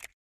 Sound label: button Hover; hotline